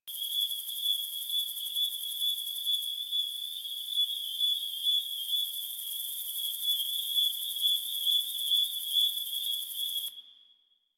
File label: night insects high loop; Ambience; Props; Loops; Warframe; Eidolon Plains